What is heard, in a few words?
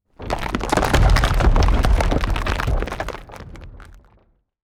rockfall